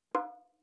Bongo aigu tonique

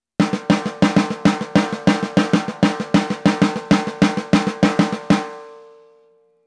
Caisse claire tamborim

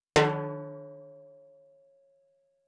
Timbales grave rim shot

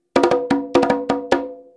frise, congas

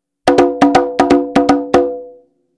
frise, congas

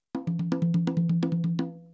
roulement ternaire, congas

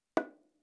Bongo 3 aigu etouffe

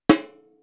Repinique baguette sur peau

